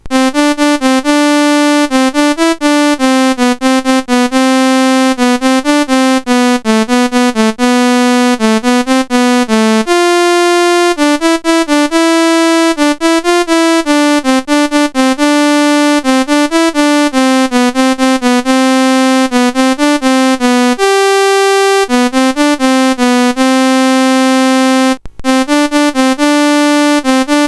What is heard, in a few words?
Computer Lullaby, CSG8580R5